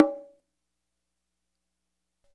bongo; InVision Interactive; Percussion Slam; MACHINE

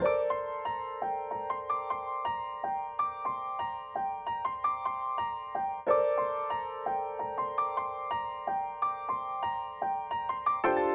piano B, Smooth RnB; 082 Gmin; Big Fish Audio; Legendary; Shes Mine

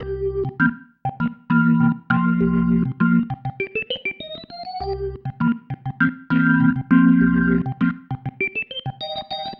Pattern, Organ

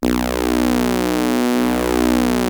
OB 1 B2, synthesizer; Hollywood Edge - Giorgio Moroder Rare Synthesizer Collection; OB 4 VOX.

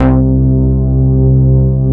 DAN, synthesizer bass; VFX-SD

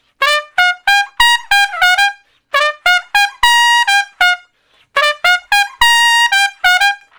Trumpet Straight (G); Solos

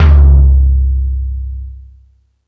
Thundadome, E-MU Pro-Cussion; drum machine

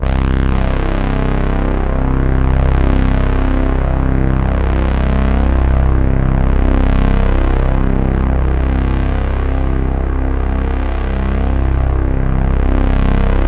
C1, Synth Line; 90 s Sample CDs; NiceBeats; SynthLine VOL-1

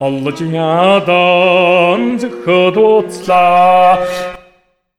MONGOL, Asian Traditions; USB Soundscan vol.60; AKAI

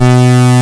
KORG, Rare Synthesizer

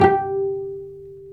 STR VC, Vcs Pz dry; STR Vcs Marc-Piz

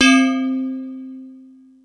mallets

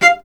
Cello; Miroslav Vitous; Solo Instruments

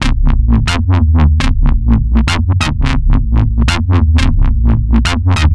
Wowwy Bass, Drum n Bass; KJ Sawka; Drum n Bass & Beyond

